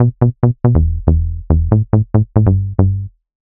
ELECTRO BASS 140 A

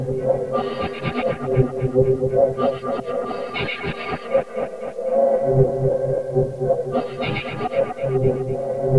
FILTER TALK; DirectWave; DreamPads

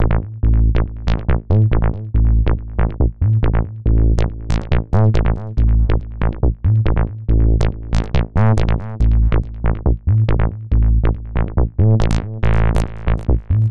HARD BASS 140 A, Hard Trance, Bass

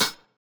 Hats, One Shots; Touch Loops; CosmicFunk

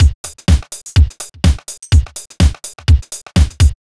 DEEPHOUSE BEATS 125

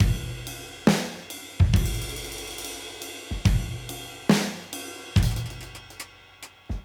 Modern Breaks; Touch Loops; ClassicBreakSessions

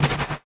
Perc, drums; Touch Loops; BlissedOutBeats

